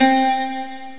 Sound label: frosted guitar